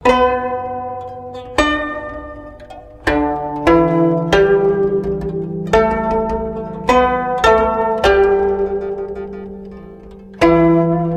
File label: Music; man jiang hong